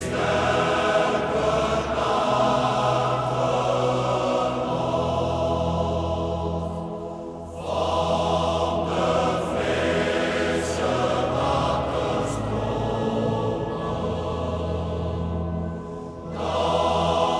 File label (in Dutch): Koor gemengd; De muziekale fruitmand